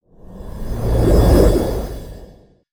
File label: Overcharged Blaster Charge